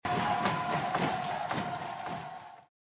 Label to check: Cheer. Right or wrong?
Right